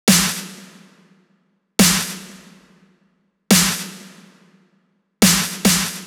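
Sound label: drum Loop 140BPM snare; Vengeance - Essential Clubsounds Vol.5; VEC5 Drumloops; VEC5 Multi Loops 140BPM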